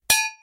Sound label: bell